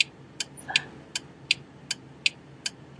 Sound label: 159 tic toc vox, drum loops and breaks; 141 to 160 bpm